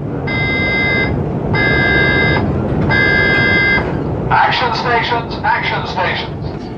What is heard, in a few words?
action stations